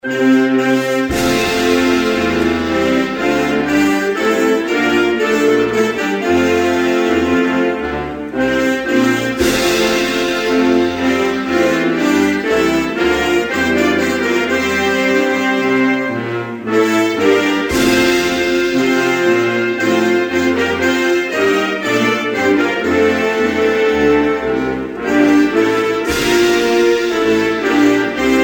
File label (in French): Turkmenistan, Hymnes nationaux